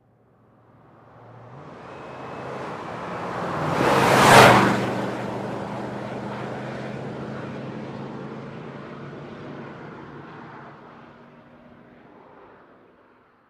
Expedition Very Fast, ford expedition

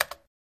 Computer Keypad Single Key Stroke; typing

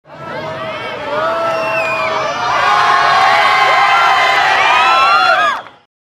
Crowd Swoons, concert

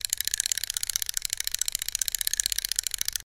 Fishing; Reel Reels In And Casts Out; Fishing Line Being Reeled In Loop; Fishing Pole

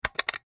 Push Button Plastic

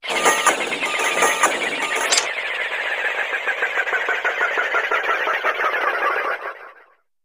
Machine Runs Steady, mechanical

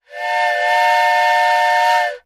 Train Horn Toy Train

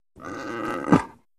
Leopard Grunt Throat; big cats

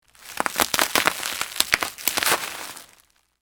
Bubble Wrap Popping

